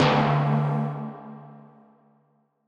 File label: Tom, 140bpm; Zero-G Sound Sense Grime Scene; GS Construction Kits; Just Flex